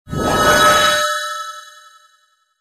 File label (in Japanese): 出現